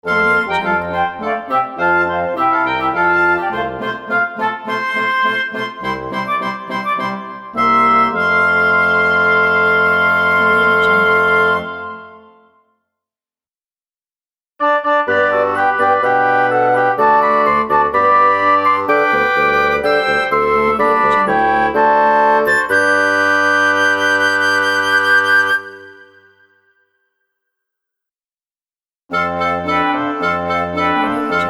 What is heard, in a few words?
classical christmas logo pack